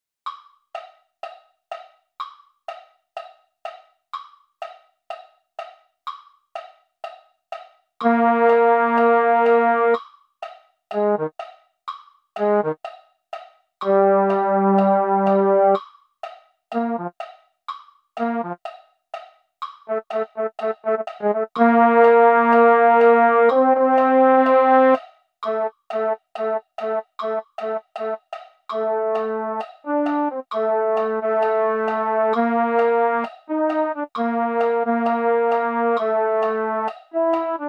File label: baritone; concert corps